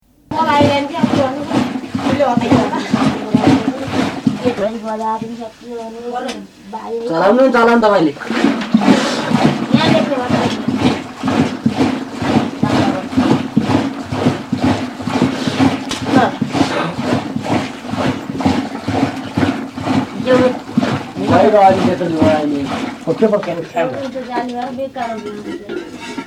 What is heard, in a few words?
Churning butter, Nepal